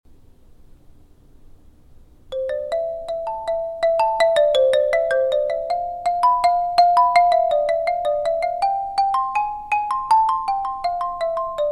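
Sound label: Xylophone with green; 8th grade all state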